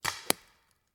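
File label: Paintball Gun Impact; Sound Ideas; Guns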